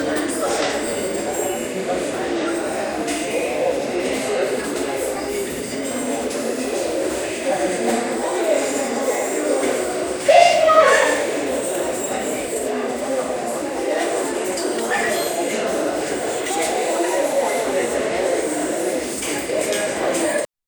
crowd chattering shop, festival

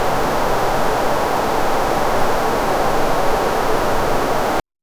gentle bubbling distant, cowbell

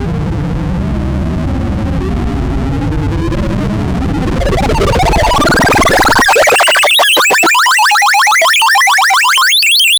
retro pixel 8bit bonus, pixel

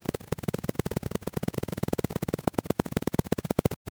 realistic rattle, reptile

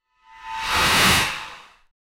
shadow malevolent force

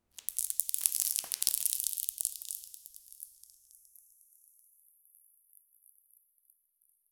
the sound of a falling, man made

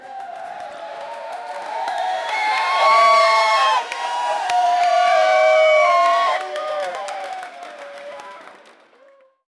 huge crowd cheering

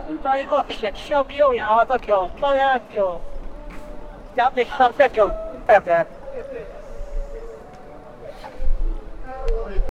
an old petrol service sta

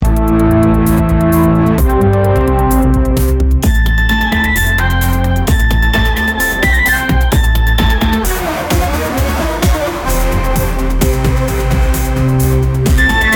sound for the intro of

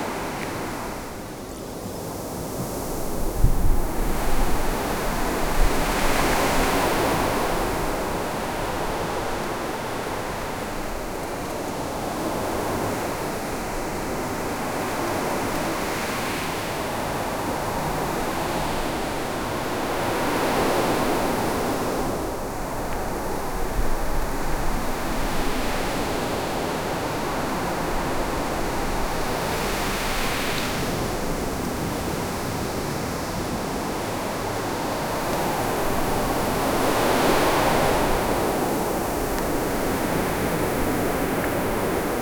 tropical ambia

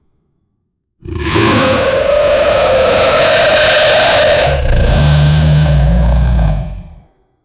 a deep gutteral terrifyin, bear

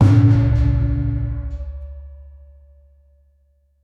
dramatic tonal cinematic, javanese

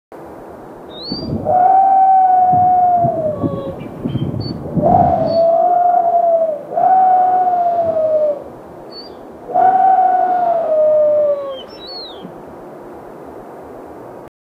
the sound of whales in, marine life

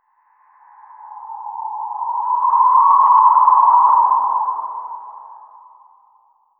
super human flying; superhero

